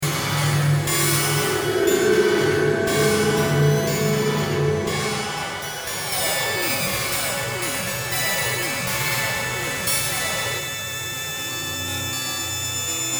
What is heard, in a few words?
Flying With UFO